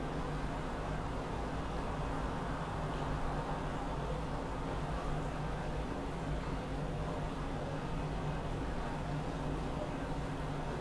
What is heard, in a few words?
sewer tube ambience; Star Wars Obi Wan; XBOX